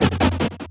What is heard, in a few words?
Hot Air Gun; Convolution; IR Samples; Kontakt 5